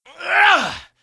Attack; Keleseth

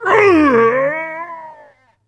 Wound Crit; Captain Rupert

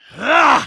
troll attack; Halazzi

